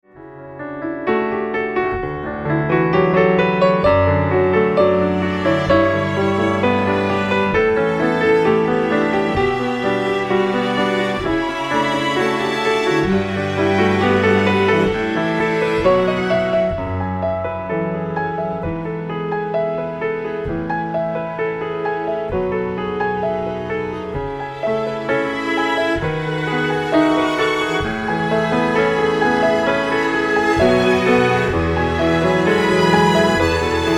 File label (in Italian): CLASSICA